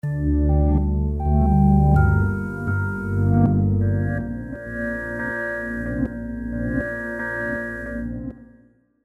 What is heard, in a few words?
Analog Strings; Output; Bioluminescence